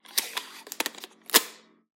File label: taping; soundscrate